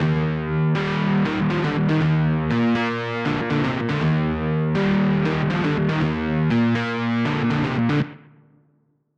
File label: Hybrid Standard Rock; midi wizard